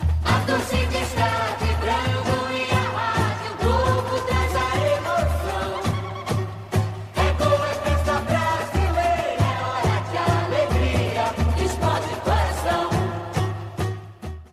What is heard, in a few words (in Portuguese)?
a torcida esta vibrando radio; radio globo